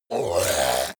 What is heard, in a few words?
zombie grunt choke male short; kreaturen